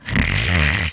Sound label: snore